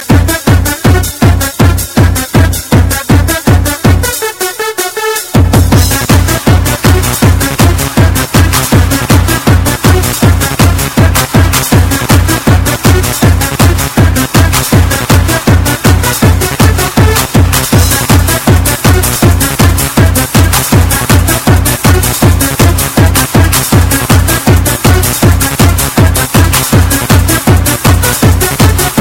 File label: Ring Tone